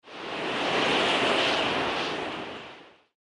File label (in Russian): potyv vetra v pustyne